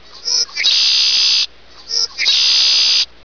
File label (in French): oiseau; Oiseau 4